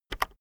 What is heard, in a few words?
keyboard external enter press very fast soft